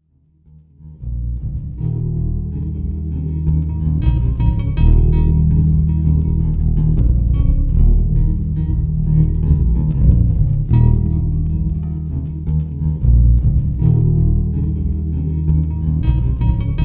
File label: bass